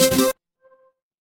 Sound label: feedback start